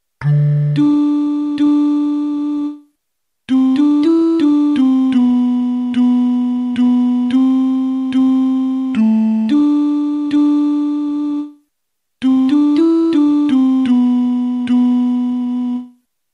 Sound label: tenor